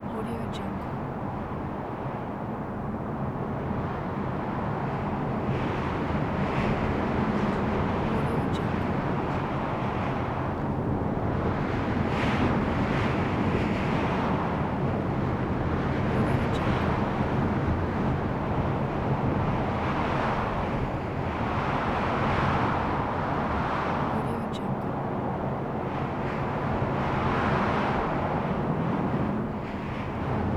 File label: heavy wind ambience